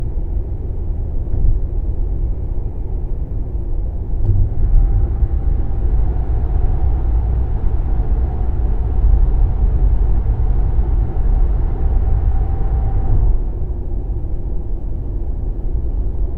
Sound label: freeway tunnel; car